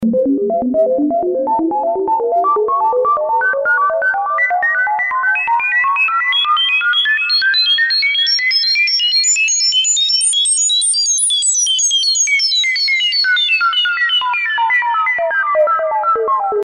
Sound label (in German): Sequenzer triggert S&H, S&H-In: Noise, S&H-Out: CV-In VCF, CV-Out vom Sequenzer moduliert; Div. mehr oder weniger komplexe Sounds mit Effekten